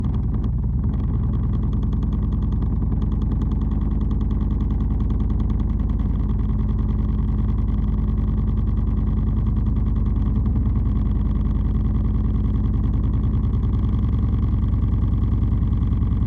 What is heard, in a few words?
Vibration, Printer, Plastic, Rattle, Dull, Monotonous, Loop